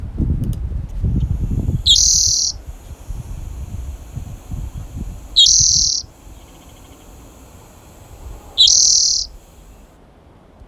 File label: spotted towhee song; Sandy Trail from the Fay-Luther trailhead; So as you start your hike up the Sandy Trail from the Fay-Luther trailhead, you may hear t